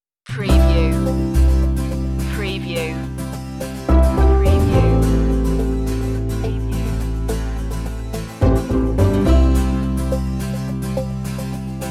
life style tv light comedy

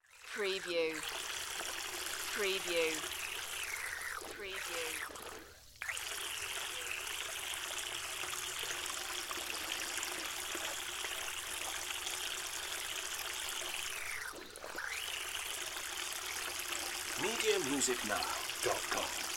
Water Hose Pour Pool; Sound Effects Bible

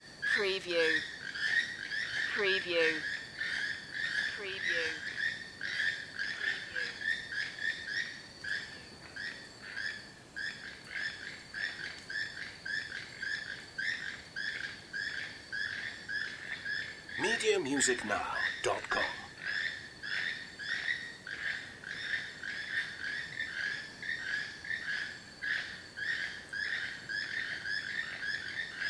birds or frogs

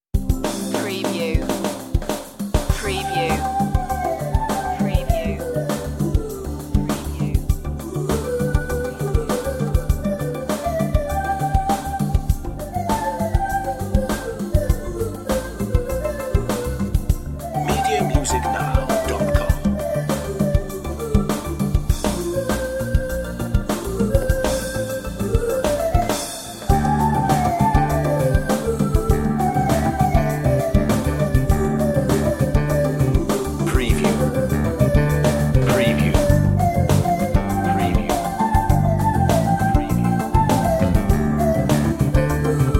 fusion break

